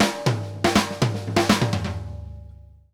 Drumworks SP fills 120; Sampleheads; New York City Drumworks VOL-1

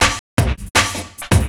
Classic Beat, Drum N Bass

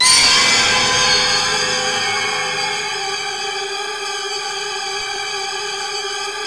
G3 ACID; Northstar; The Wizard VOL-1; ORCHESPHERE 1

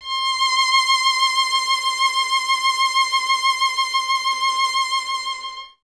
violin, Solo Instruments

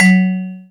TUNED PERC; Greytsounds VOL-1; LATIN PERC

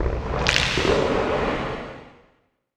SPACE-FX

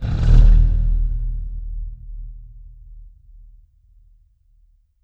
Pipe Rub; Effects

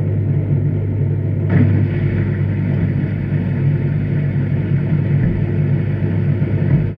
Washing Machine, contact mic, mechanical; musicradar; contact mic madness; Raw

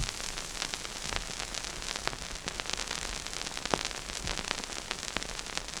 Vinyl Old 78, Noise; layering

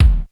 AMB KICK, drum; Crypton - DrumBase5000; WS DRUMS

